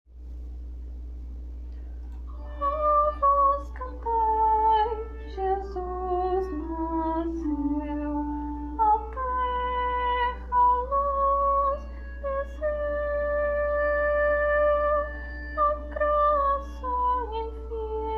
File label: soprano